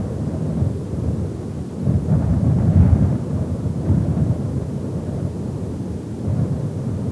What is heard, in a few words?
Wind